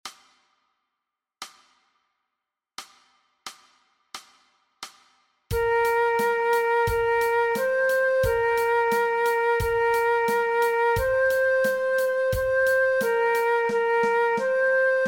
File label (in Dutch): MEESPEELTRACK Fluit alternate; Dwarsfluit aangepast; Nij Talint Orkest